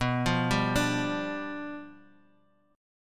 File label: G 7th B